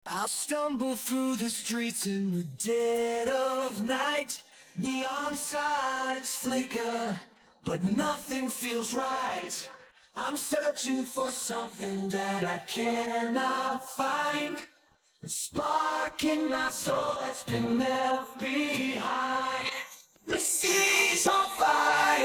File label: vocals G minor 95bpm 440hz